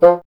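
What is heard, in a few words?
wind bassoon F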